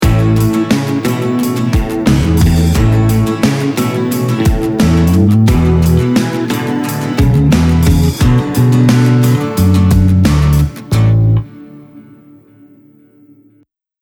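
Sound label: double guitar full playback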